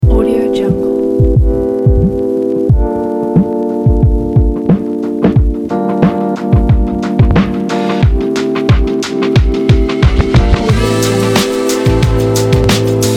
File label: warm hiphop